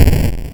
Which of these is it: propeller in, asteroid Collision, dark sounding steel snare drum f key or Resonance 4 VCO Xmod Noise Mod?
asteroid Collision